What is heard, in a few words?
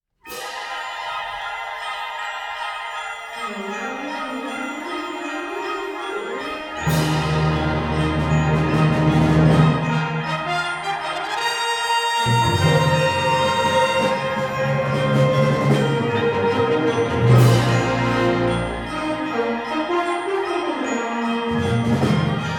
Fanfare